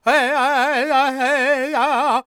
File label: World Voices, Lakota; Spectrasonics Vocal Planet CD5